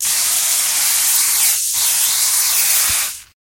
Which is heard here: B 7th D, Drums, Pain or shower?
shower